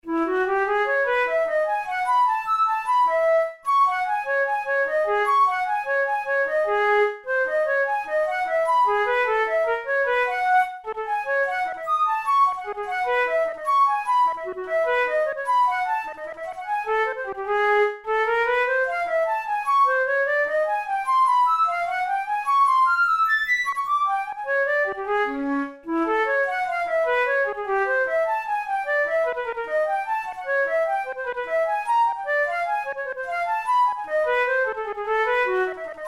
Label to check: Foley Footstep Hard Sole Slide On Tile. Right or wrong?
Wrong